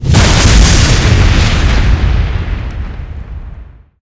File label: spell mirv explode primary; halloween; video games; source engine; tf